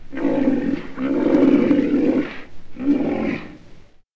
rhinoceros

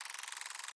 scroll

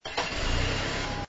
car start

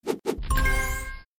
sword swing monster kill right answer

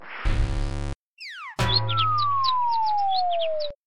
monster bite blast fall life over

